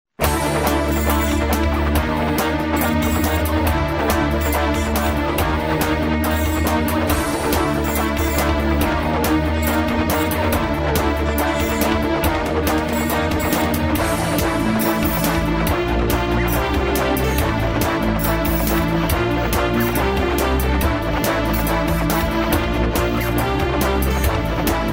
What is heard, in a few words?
Theme